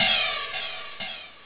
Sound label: crash